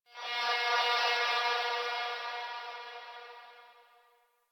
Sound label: Texture One J, Ambience; Warframe; RailJack